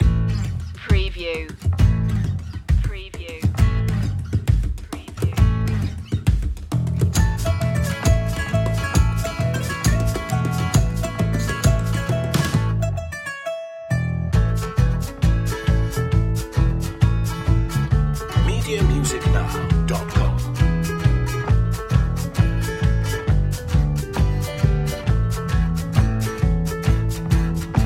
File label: old irish tavern underscore